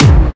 puffy top end kickdrum sound g sharp key

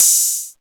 small reverb open hi hat one shot a key

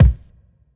fat kick single shot b key; tuned drums

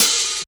low treble open hi hat sample C key

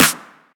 snare b key; drum single hits one shots; snare drum samples

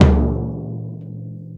low mid tom sound a sharp key; tom drum

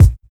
original bass drum one shot g key

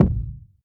warm sounding kick drum g sharp key

